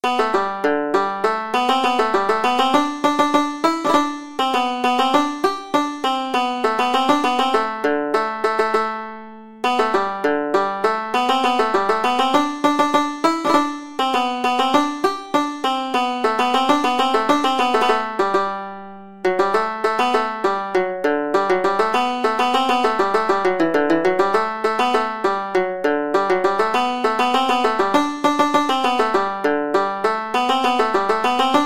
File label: BAN, banjo